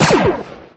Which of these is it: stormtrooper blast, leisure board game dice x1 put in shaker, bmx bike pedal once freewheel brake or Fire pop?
stormtrooper blast